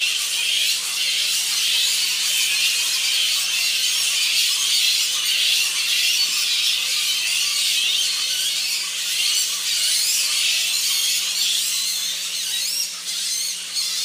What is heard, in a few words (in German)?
Flughunde